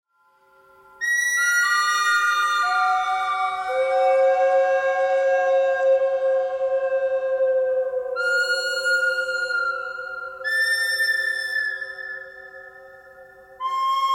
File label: bird cry thread